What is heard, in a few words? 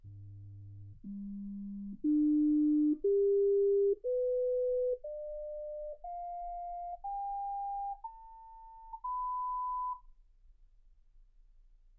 sinus tones 48kHz